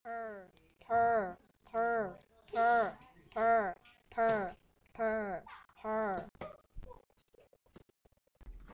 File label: purr